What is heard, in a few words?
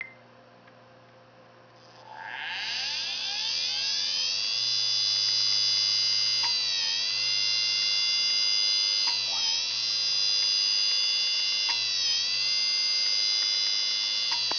whining